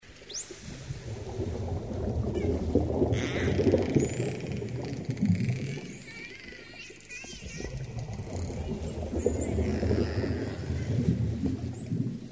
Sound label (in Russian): dolph kiti, ZVUKI PRIRODI